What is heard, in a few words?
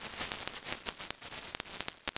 noise